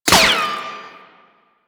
Sniper Ricochet; DE; Warframe; Frumentarius; Exalted Sniper

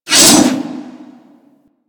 Great Sword Swipe

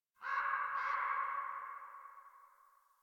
Tree Distant Critters; Ambience; Props; Warframe; Duviri

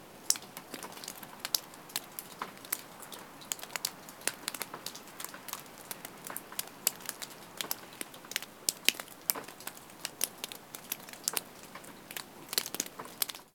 Under City Water Drip Loop Close; Ambience; Dungeons

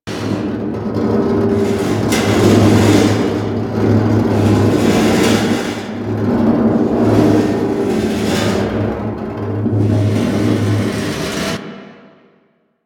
Machine Malfunction Loop; Ambience; Props; Warframe; Corpus Railjack

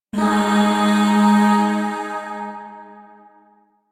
Shawzin Chromatic Chord F; Chords; Warframe; Zariman; Void

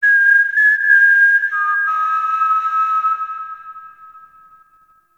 Puzzle Beacon; Warframe; Ambience; Duviri; Bombastine Encounter

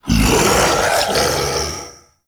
Male Vocal Breath Heavy; Enemy; Mockeries; Sub Boss; Mockery

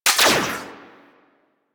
Rifle Mech; Grn Kuva Lich Rifle

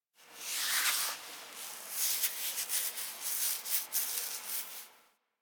foley interact scratch sit right; Enemies; Warframe; Catbrow

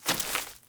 Sprout Farmer Grass Step, Steps; Characters; Ambient; Sprout Farmers

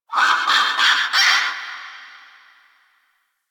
Forest Creature Mid Far; Ambience; Warframe; Grineer Forest

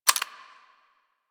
Rifle Mech; Bard

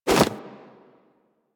Arm Swing Foley; Warframe; Purgatory Warrior

